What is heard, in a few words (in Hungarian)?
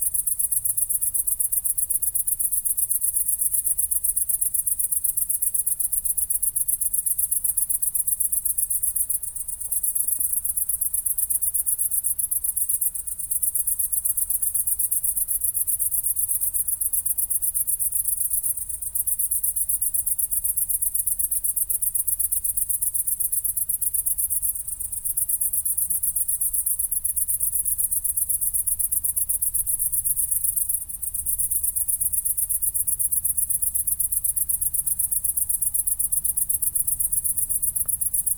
szöcskék magyar tarsza felé, Borsodi-karszt; termeszetben